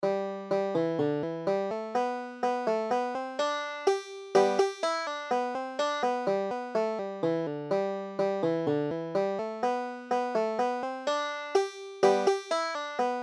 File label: banjo